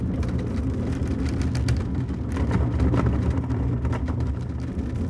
reel spin freespin; twin spin mw

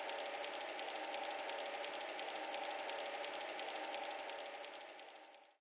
reel Spin Free Spin; jackhammer 2

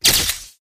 win symbol Flash; wild worlds

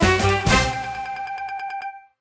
mediumWin; jackhammer 2

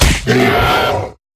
Boss Hit Light; wild worlds

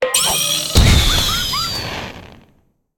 bonus Double; fairyred

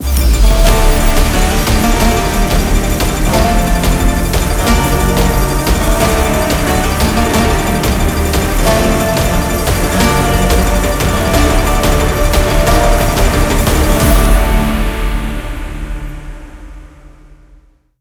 mega Win; mercy of the gods

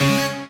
basic Win Line; mega joker

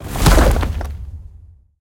nudge Large; temple of nudges

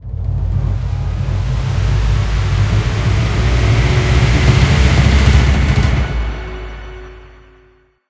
nearwin; alohaxmas

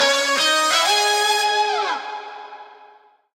mega Win End 7up; hotline2

